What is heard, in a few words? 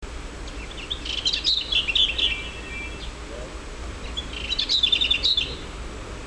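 Sylvia curruca song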